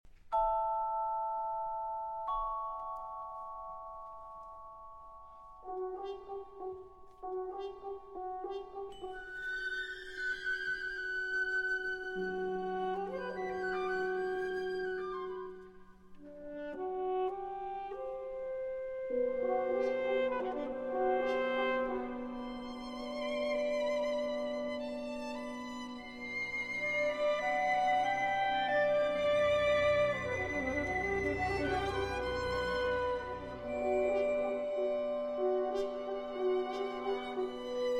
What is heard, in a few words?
Double Concerto For Alto Saxophone, Percussion and Orchestra